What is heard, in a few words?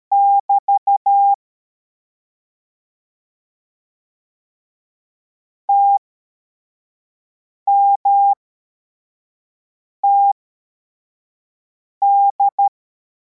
cw, morse